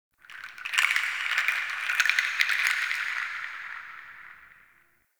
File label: Wood Chimes; Ambience; Warframe; Nokko Colony